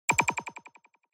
Onoma Surprise High Long

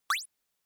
NES General Pitch

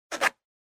Ballpoint Pen Check

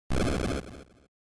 Action Door

